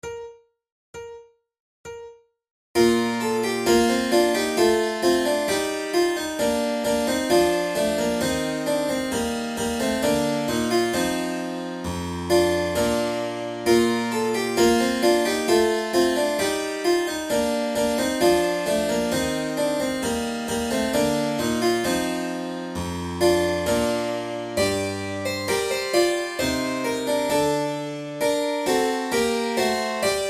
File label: hornpipe, flute